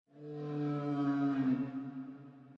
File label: Dino Distant; docks